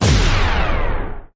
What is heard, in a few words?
Big Shot Noise Zap